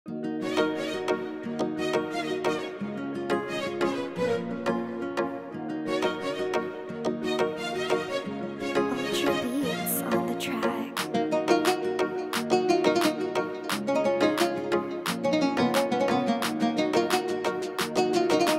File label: oriental reggaeton type beat instrumental